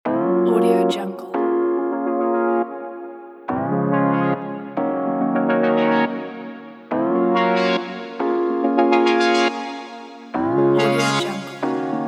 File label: future chill beat